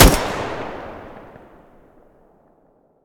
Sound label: groza muzzle stereo, weapons